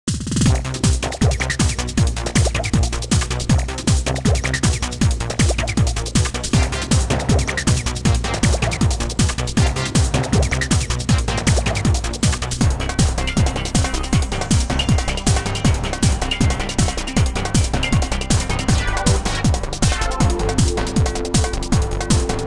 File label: Battle Boss Match; The Denpa Men; Android